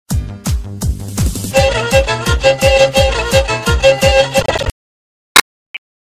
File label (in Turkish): hizli kemence Karadeniz horon havasi